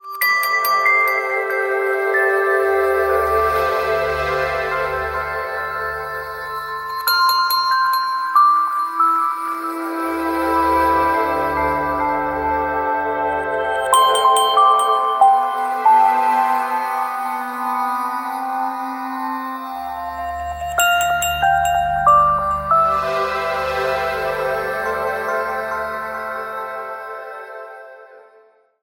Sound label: drizzling ringtone; oneplus